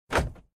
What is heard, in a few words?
lock